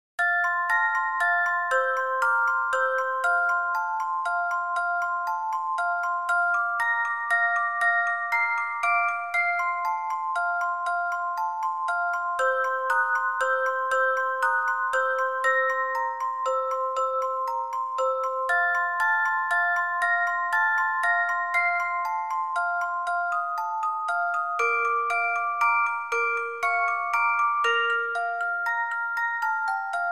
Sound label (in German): wiegenlied; オルゴール チェレスタ ミュージックボックス; フリース; モーツァルトの子守歌（vol.2）